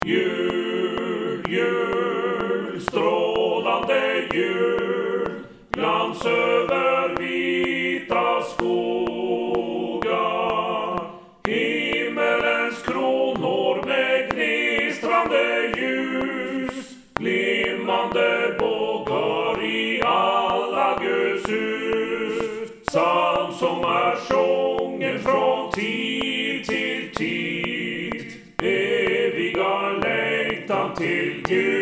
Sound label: TUTTI click